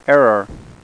error

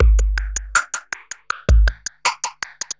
DRUM LOOP ambient 160

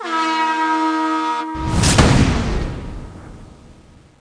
Empty Recycle Bin; win98

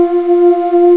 Shakuhachi; Star Trek; 22k

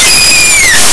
HOWLING

